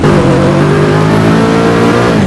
second, vehicles

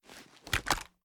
reload end

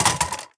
phys mag drop large drum concrete, magazine drops

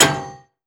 frag physics bounce metal hard; gmod; viper

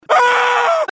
scream, male; gmod; npc

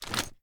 pi reload empty fast end; gmod

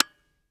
fire disconnector; gmod; viper

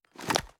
ar akilo47 reload empty fast end; weapons; viper

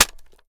reload hit mag, reloads; iw8; gmod

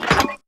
user interface progress circle stop; gmod; mw

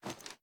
reload end fast rotate; gmod

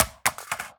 phys mag drop ar metal concrete, magazine drops

